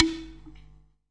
Medium pot Soft M, Clay Pots, ethnic and world percussion